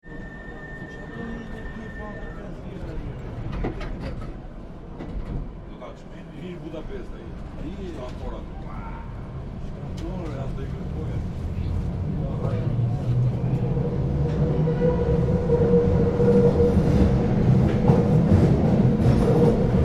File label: Train Subway Underground Interior Travel; Various starts and stops - doors open and close - passenger voic